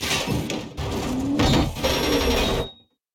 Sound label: reload; Tacz1.1.5+; RSWS 1903